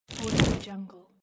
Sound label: fire scroll